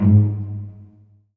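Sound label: pizzicato, strings; ILIO; Kirk Hunter Virtuoso Strings VOL-2; 10CL TRM FX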